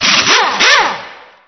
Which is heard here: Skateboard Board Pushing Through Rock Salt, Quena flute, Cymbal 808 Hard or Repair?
Repair